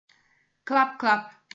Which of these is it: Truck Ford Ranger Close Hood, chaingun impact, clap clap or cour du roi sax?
clap clap